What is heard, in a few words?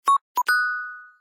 android notification